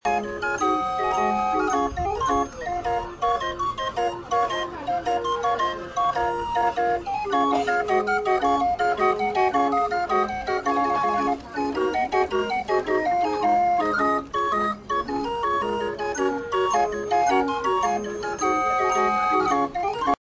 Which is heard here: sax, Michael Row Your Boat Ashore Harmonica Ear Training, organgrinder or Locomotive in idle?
organgrinder